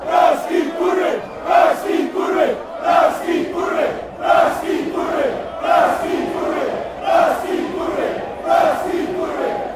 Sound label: fanchants; prazsky krvy